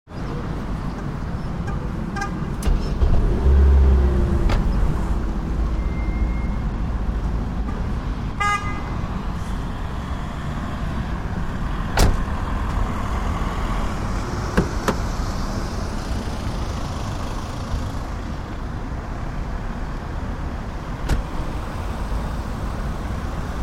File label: Traffic at a shopping centre car park; Traffic and Cars